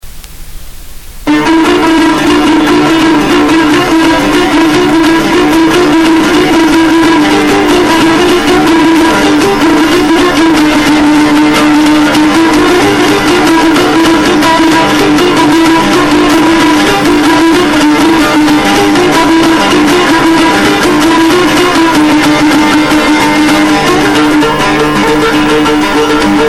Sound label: Solo Tambour